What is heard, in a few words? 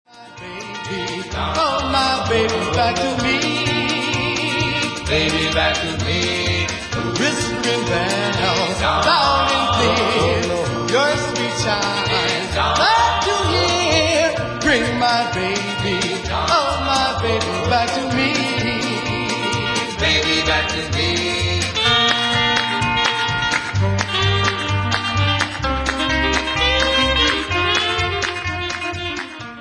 Whispering Bells